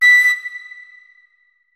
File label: flute notes; Hideout Furniture